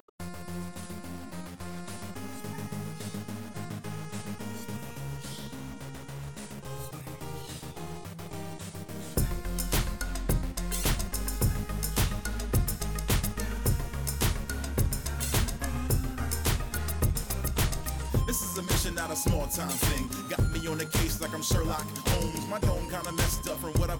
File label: sampling rate 44.1